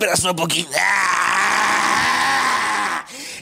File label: aaaaahhhhhhh